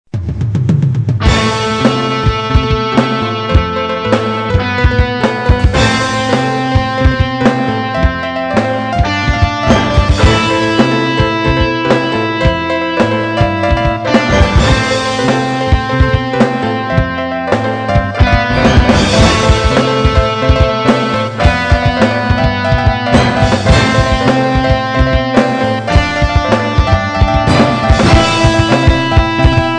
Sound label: Tech Metal